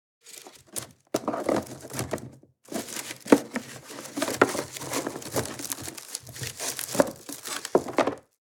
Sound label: Picnic wicker basket unpacking food